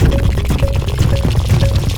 Mus Village Build 120; From Dust; ps3; xbox 360

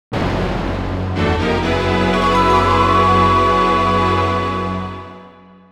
Battle Victory; Jade Cocoon Story of the Tamamayu

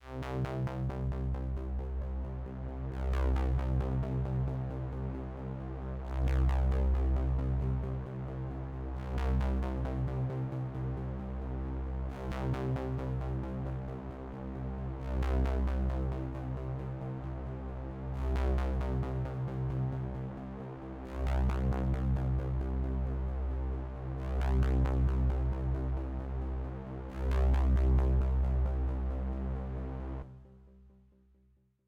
Music BASS; StyxMusic

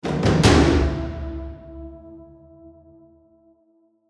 Tension Perc End

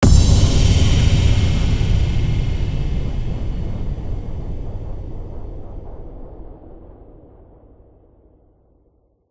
Suspense; The Eternal Cylinder ps4 ps5 windows xbox one xbox series xs gamerip 2021

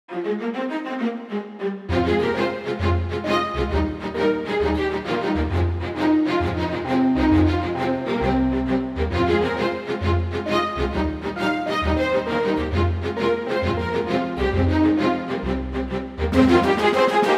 Menu Song; Phoenix Force

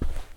Carpet Foot Step

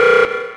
ALARM Distorted Echo loop stereo

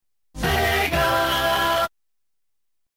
Logo; Hell Yeah! Wrath of the Dead Rabbit; SEGA Logo

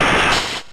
Horn Drill; Pokemon Reborn E19

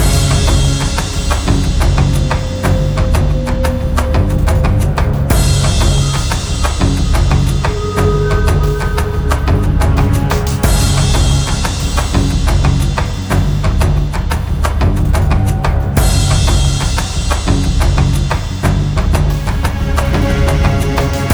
Actionloop

